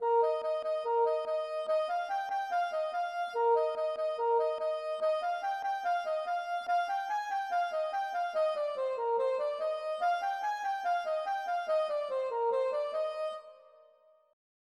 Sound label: plin polka; Plinn